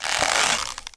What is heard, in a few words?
bow start; deathlegends